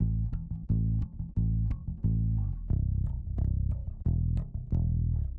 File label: Bass A, Hip Hop Exotica, 089 Amin; Big Fish Audio